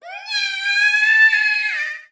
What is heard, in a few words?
korok runaway